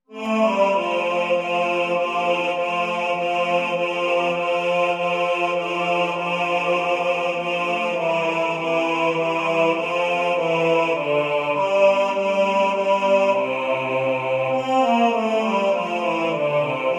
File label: Away In A Manger Bass